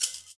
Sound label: shakers